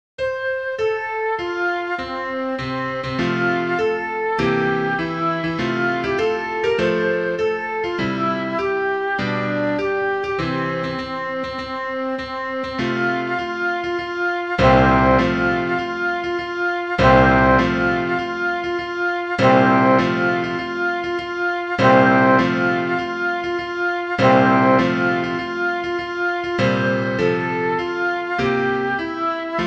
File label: Backing Track Nocturnal Animals Song